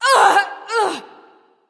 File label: NV Ouch; smite; awilix